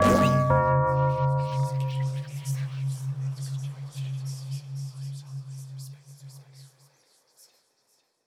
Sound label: D M G Curse